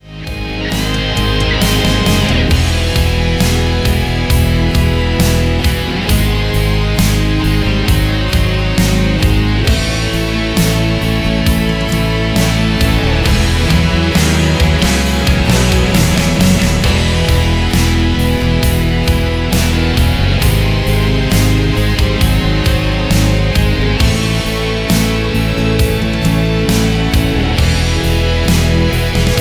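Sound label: Professional instrumental soundtrack without lead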